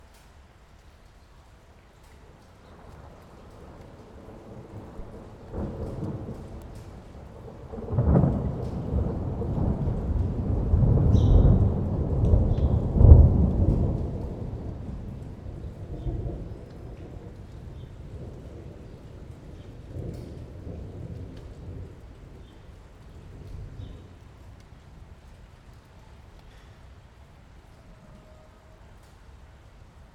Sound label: Rain Thunder